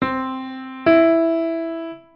tercera mayor ascendente; intervalos; musica; melodia; terceras

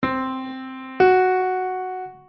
cuarta aumentada ascendente; intervalos; teoria musical; melodia

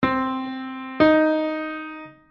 segunda aumentada; intervalos; teoria musical; melodia

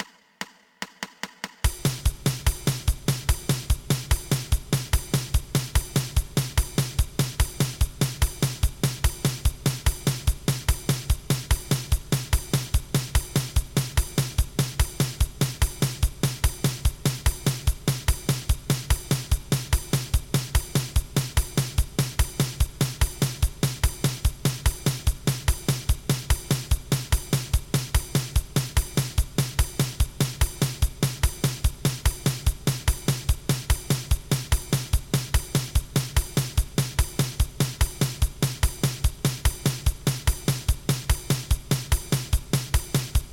2 pulsos

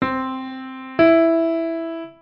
cuarta disminuida ascendente; intervalos; teoria musical; melodia

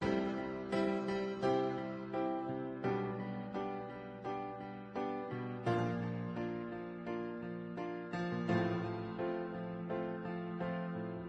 loop do re mi

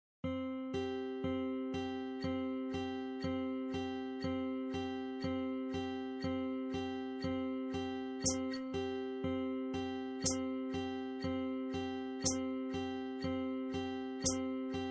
loop improvisar do mi

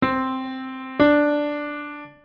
segunda mayor; intervalos; teoria musical; melodia

